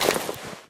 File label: hunting rifle helping hand extend; Left 4 Dead; Source Engine